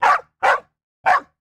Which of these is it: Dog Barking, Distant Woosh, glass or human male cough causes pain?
Dog Barking